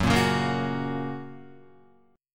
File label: F sharp Major F sharp Guitar; strummed; JGuitar